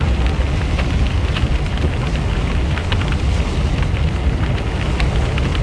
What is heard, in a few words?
tank burning; ambient; karelia; World of Tanks Blitz